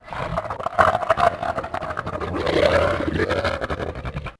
Cerato Growl, Dino

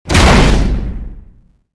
grate destroy

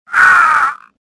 death

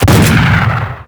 shoot jackhammer; weapons; Max Payne

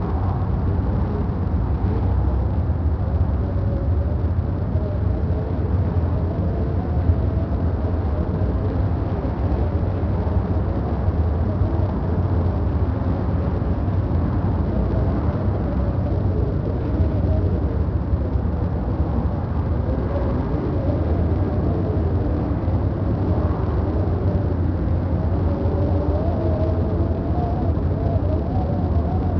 Skyscraper Indoors Stormy; noise; Max Payne; XBOX

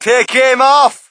crowd, shout; Mike Tyson Heavyweight Boxing

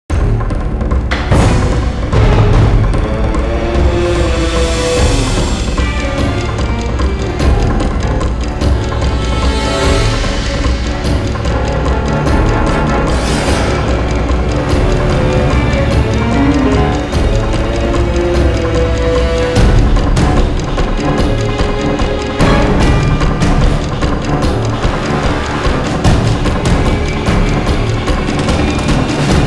Fight; music; The Da Vinci Code; XBOX

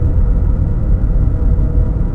interior, ambience; Star Wars Jedi Knight II Jedi Outcast; XBOX; artus

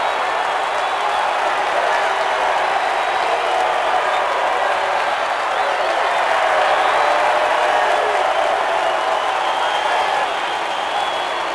cheers, crowds; Colin McRae Rally 3; XBOX

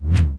pike swing; weapons

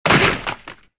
bodyfall trooper; stormtrooper

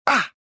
pain25; prisoner

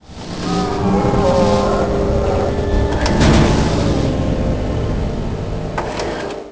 Sotha Fab Machine; The Elder Scrolls III Morrowind GOTY; XBOX

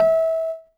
piano e; school; Tony Hawk's Project 8; XBOX; inschool

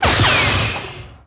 lightsaber hit

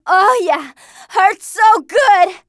hurt; TrueCrimeStreetsOfLA; XBOX

